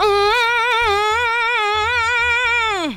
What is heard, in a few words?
MMMMM, FEMALE; AMG; Global Trance Mission VOL-2